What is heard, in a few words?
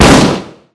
shotgun; blackshades